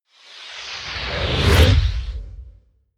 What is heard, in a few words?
whoosh air